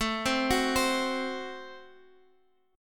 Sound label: F sharp Diminished A